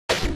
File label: spin attack; owlboy; otus